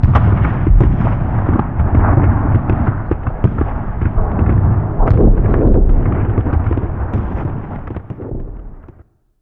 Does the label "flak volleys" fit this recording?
Yes